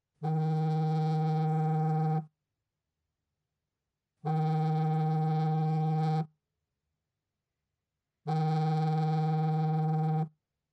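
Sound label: phone vibration sound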